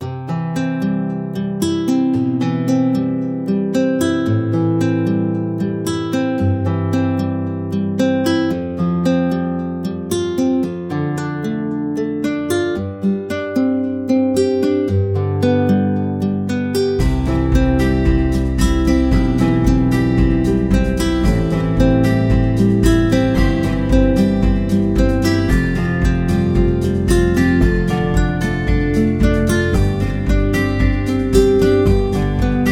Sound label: Wedding Song